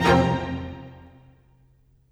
Strings

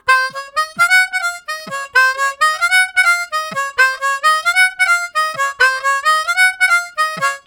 Meloding, Harmonica Riffs; Zero G - Harmonica

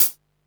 hat, Techno 138 BPM

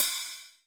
Cym Tiny, Alesis D4; Drum Machines

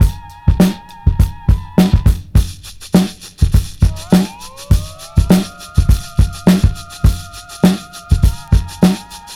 ILL BEAT 102.5 bpm, Breaks; POTBreaks

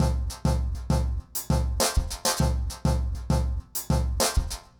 Remixed Drums 100BPM; The Roots Drumloops

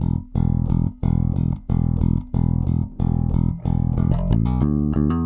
Rock, Bass; Bass X

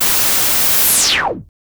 Whoosh, sci-fi

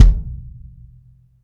BD, Bassdrums, Roland TD-20

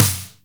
Snare, Akai MPC-500; Toolkits; Snare TKit

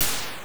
Boom, Genesis; Super Fantasy Zone; M8

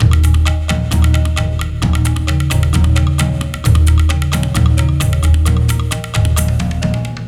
Percussion Combos; M-Audio Pro Sessions; Abstract World Fusion 2

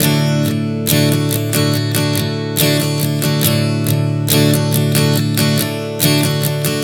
Strum 070 D, One Chord Strumming 070 bpm; Sonic Foundry (Sony Creative Software); Songwriter Acoustic Guitar Companion

